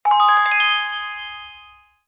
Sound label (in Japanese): kirakira